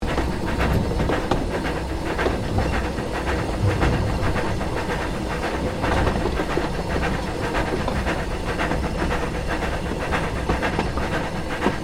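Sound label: Train Steam Locomotive 0-2-0 Pistons; Kraus No5682; 1906; Vehicles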